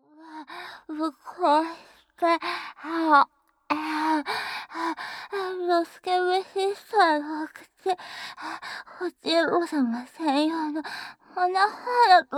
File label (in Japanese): イラマチオおねだり; お口をオナホにしてイラマチオおねだり♪